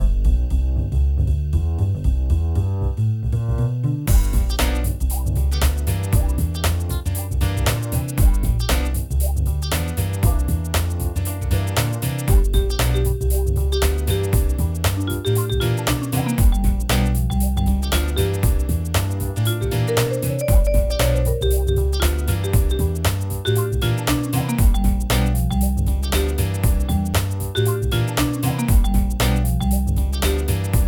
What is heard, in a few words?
Up Beat Jazz, orchestrated jingles